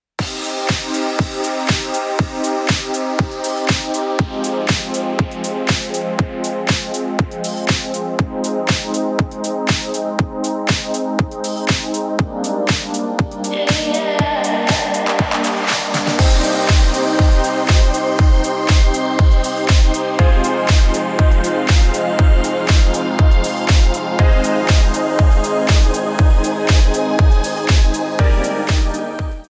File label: Ringtone